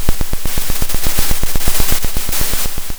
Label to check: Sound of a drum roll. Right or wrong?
Right